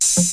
Studio Foot Hi Hat Sound A Key, Royality free pedal hi-hat sample tuned to the A note